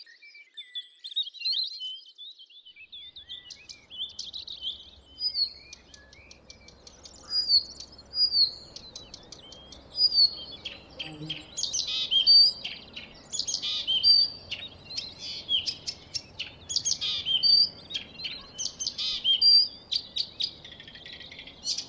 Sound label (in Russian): drozdovidnaya kamishovka